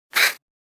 Streichholzschachtel oeffnen

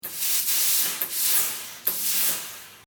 Luft zischen

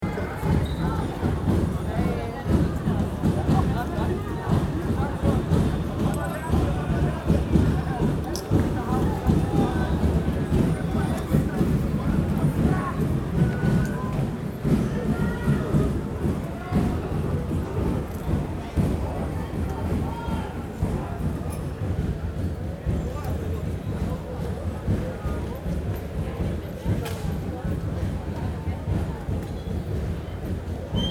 Schanzengroove

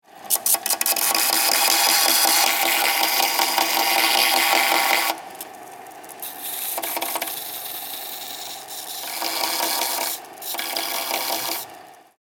Schleifen

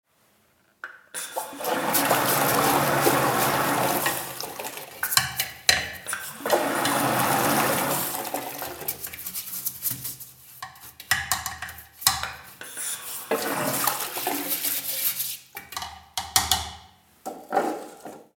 WC putzen

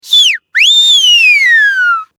Pfiff

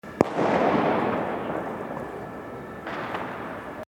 Silvester Knaller

